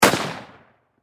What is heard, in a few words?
Warfare Rifle .30 Caliber M1 Garand Semi Single Shot Distant Perspective